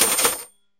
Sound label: vehicle arrive, sfx